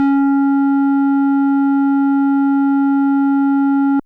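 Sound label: FM Sq Dist DX100 C#1; square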